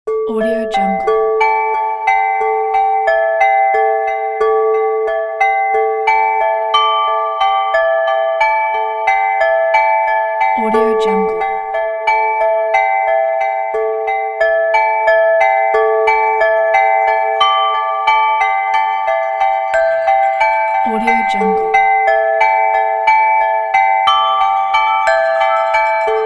meditation bells pack

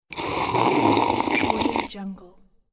slurp; applehillstudios

